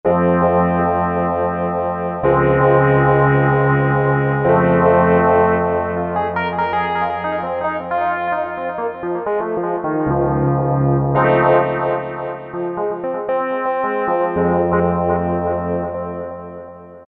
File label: Vintage EP, Korg MS2000; Factory Patches